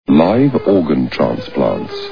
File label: organ